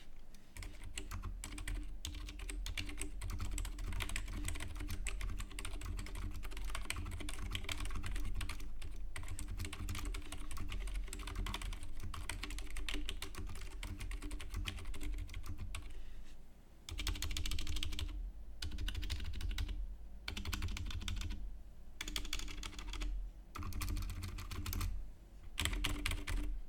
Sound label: SilentPeach soundtest